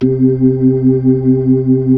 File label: B-3; Roland LCDP10 Keys of the 60s and 70s 2